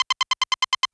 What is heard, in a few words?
bomb beep; Valorant; raze